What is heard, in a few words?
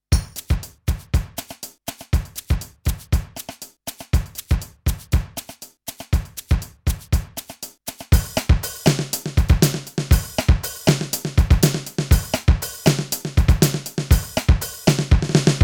Kawai XD-5 Groove